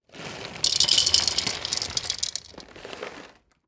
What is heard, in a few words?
Pouring Dry Cat Food; افکت صدا حیوانات; Animals